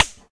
Balle Helice

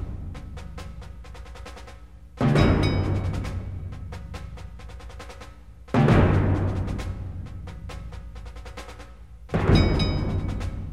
Ambiance - Musique; bet on soldier blackout saigon

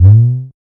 Batte Big

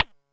Balle Porte

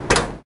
Arrivee Balle

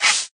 Boss Bouche Fermeture

